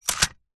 gun magazine set down weaponry

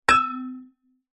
impact metal hollow tube

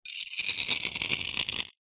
foley concrete scrape with metal sharp

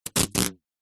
office sellotape rip

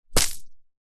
soil dirt handful throw on ground; zapsplat; foley

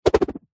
throw object spin whoosh air; foley

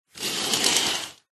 household curtains old draw pull

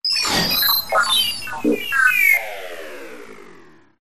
science fiction robot failure glitch shutdown

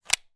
weapon gun walther ppq; still north media

